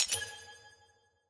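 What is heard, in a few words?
voice chat team push to talk click; premade voice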